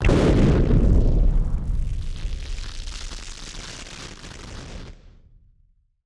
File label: Party Popper FX